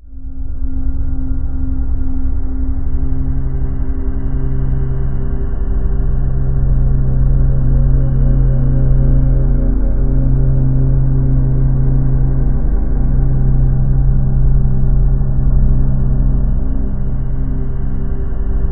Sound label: TIBET, LOW TONES, Ominous Drones; USB Soundscan vol.48; AKAI